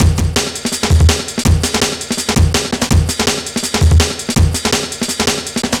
165, Drum Loops, Drum & Bass

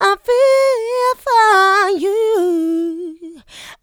mixed vocals; Houseworx

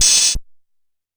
Cymbal Hi, Stylophone Beatbox

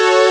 cord string, Amiga Soundtracker; ST-XX Amiga Soundtracker Sample Packs

